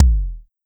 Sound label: Kick, Pollard Syndrum